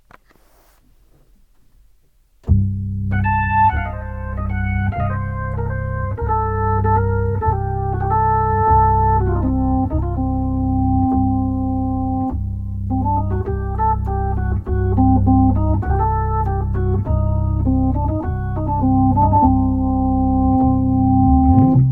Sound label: hammond piano